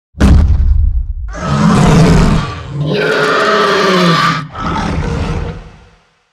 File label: Monster Bonus Win; Monster Lizzie Bonus Win